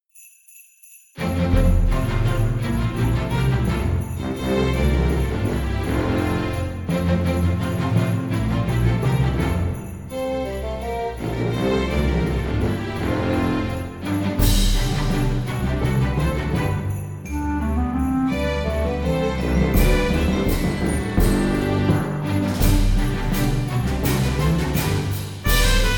days of christmas instrumental

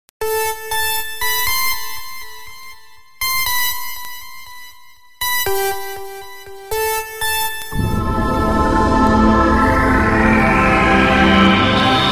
space synth

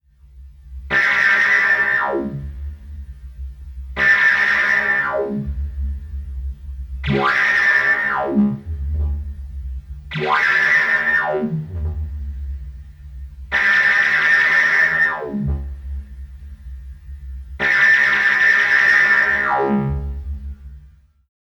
laser atillery sound fx; alan howarth

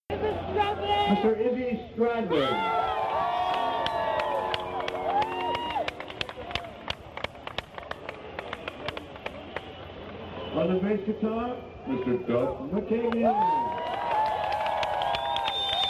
drum solo; band introductions

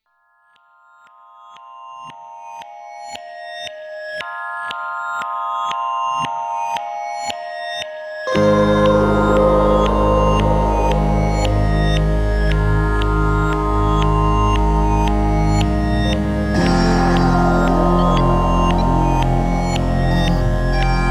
planetary ambience

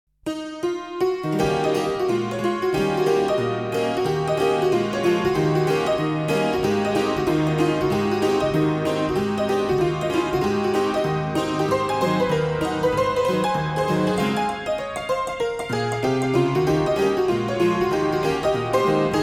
piano saloon

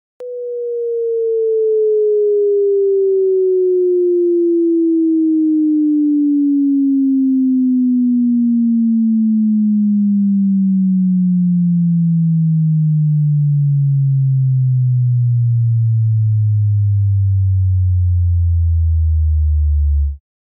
500 to 70; 10 db

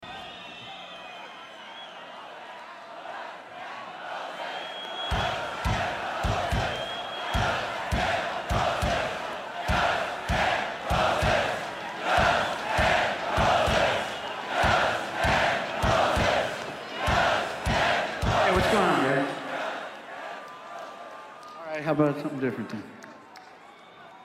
crowd; rio

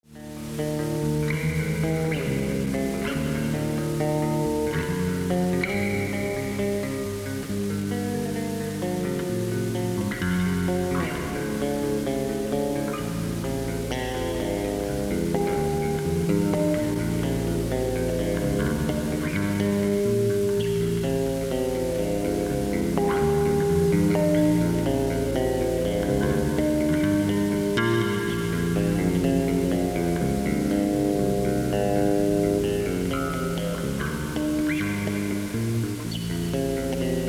bass solo

